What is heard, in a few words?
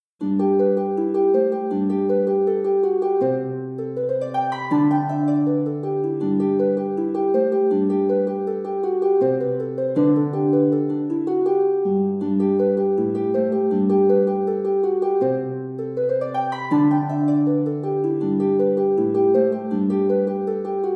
Harp Arrangement